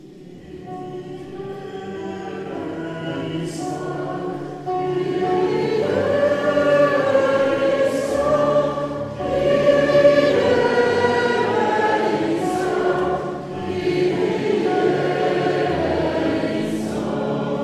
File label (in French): gospel Tutti; Kyrie peuple de dieu